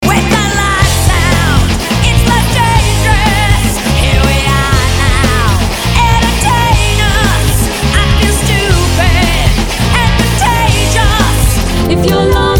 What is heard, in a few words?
Pop Rock